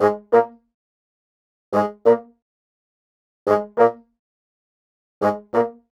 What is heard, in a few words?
Horns, Cinematic Funk Urban; Sonic Foundry (Sony Creative Software); Cinematic Funk Urban Tone Poems